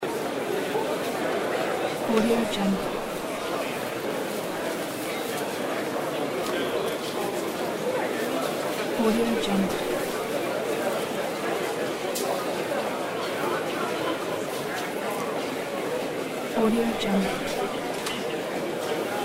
huge crowd interior

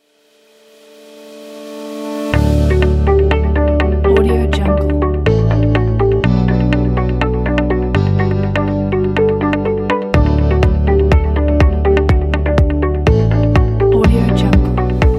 modern advert

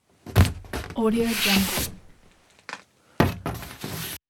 foam board plased on easel

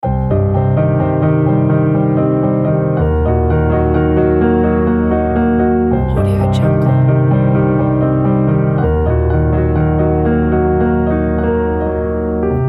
dark halloween piano

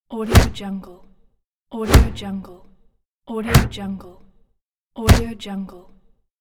stamp of approval

